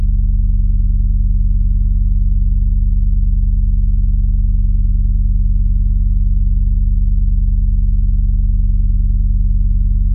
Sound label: 200Hz plus